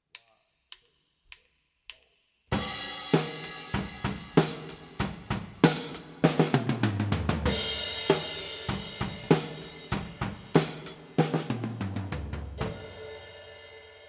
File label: Delay Overhead, drum recording; Drum Recording Setup